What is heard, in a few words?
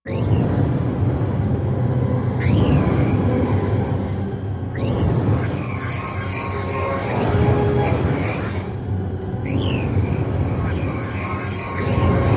Circus Panic Machine, musical